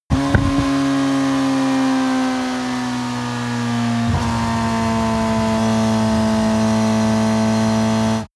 motorcycle sport bike deceleration high rpm

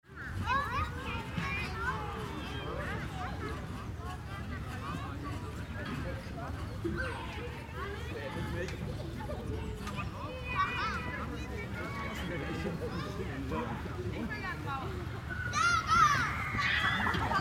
open air bath

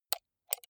computer mouse right click slow

